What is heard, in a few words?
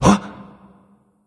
surprise; smite; hou yi